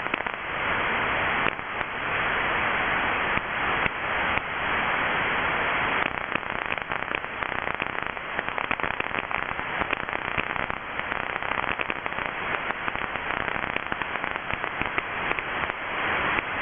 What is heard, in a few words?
PLC noise on 50110 kHz